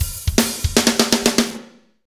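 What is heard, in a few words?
fast shuffle, Roland drum beats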